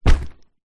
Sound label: foley bag rucksack set down carpet